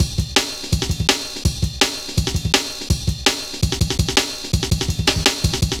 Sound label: Rollin' Ride, Jungle Warfare, Drum Loops, 165.5